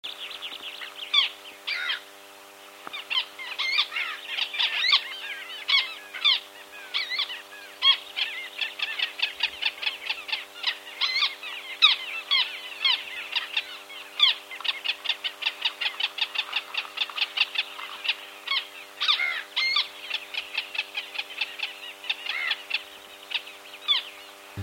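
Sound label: little gull voice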